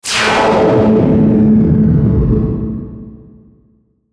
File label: Descend Burst Power Down; Descending Sound Effects; Blastwave